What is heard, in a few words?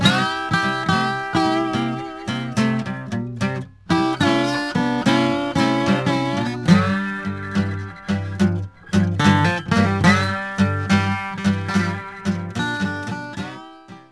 blues